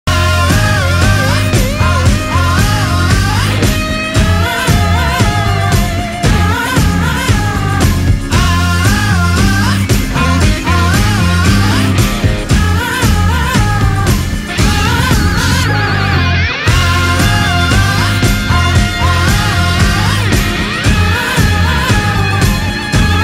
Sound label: english song ringtone; lyric song